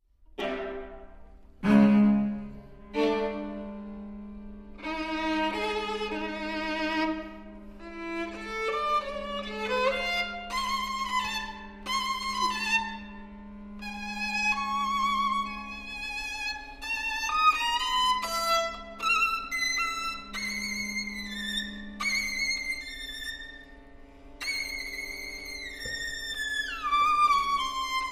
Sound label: Shostakovich Quartet Op. 117